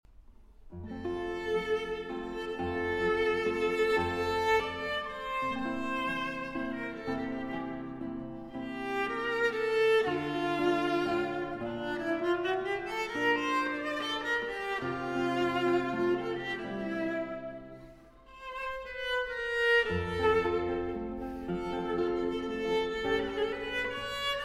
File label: Quartet in C Major, Larghetto; Quartet No. 2; Op. 4 No. 2, MS 29